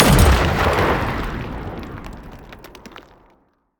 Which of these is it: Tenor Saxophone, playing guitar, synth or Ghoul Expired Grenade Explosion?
Ghoul Expired Grenade Explosion